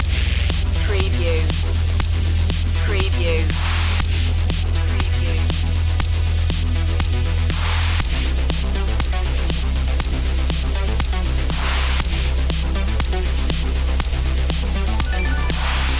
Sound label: synthesis loop